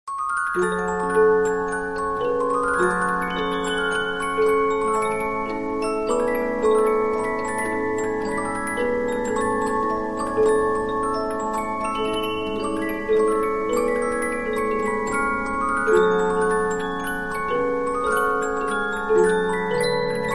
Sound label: Polyphon, 15inch disc musicbox